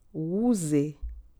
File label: water